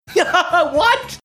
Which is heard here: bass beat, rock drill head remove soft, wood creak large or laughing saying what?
laughing saying what